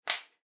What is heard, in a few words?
household hair clipper plastic cutting guide remove from unit